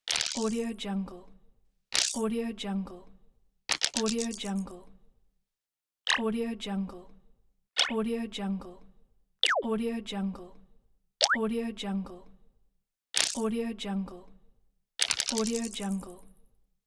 futuristic ui sounds

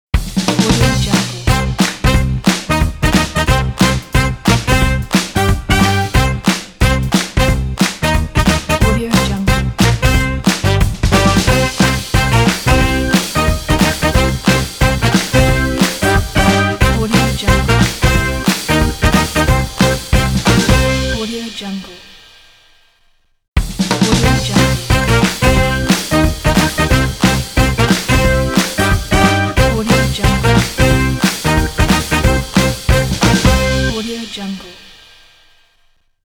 fun quirky upbeat ident

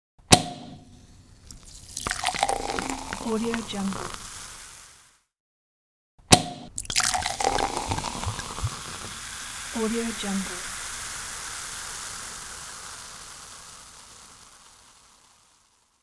pouring champagne

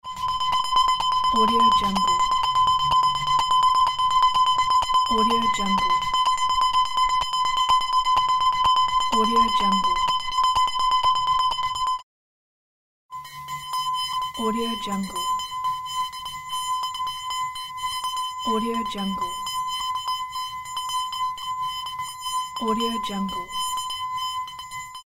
rhythm

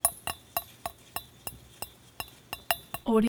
water drip in empty pan small double drips; prosoundfx

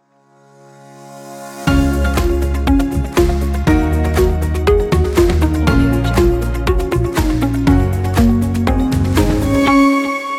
corporate upbeat inspiring ident; centralperkproduction

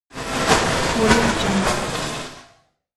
machine movement

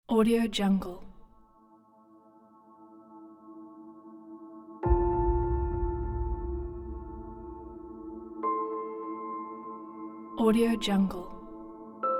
epic dramatic hybrid trailer

